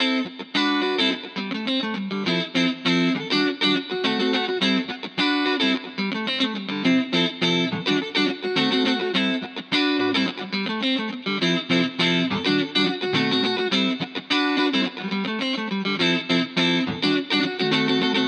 Funk

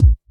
Backbeat

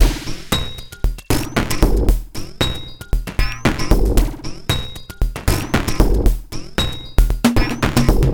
115 Bpm Stab Loop

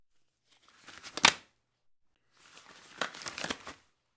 Gloves Latex Rubber Snap

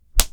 Slap

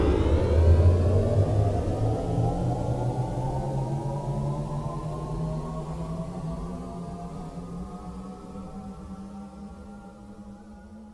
Future Car Or Spaceship

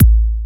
Kick Soft Bright

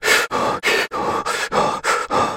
Heavy Breathing

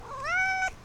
Meowing

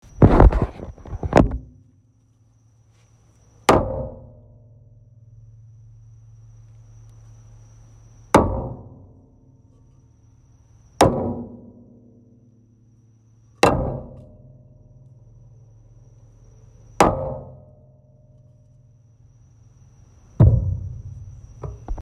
gong like pot smash

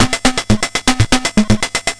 Samba

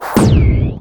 Game Pitched Explosion

